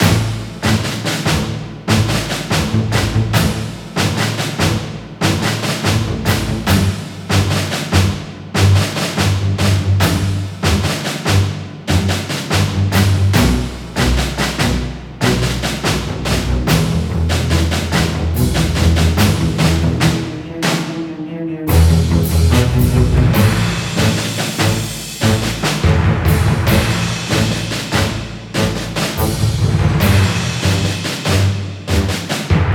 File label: TENSION LOOPABLE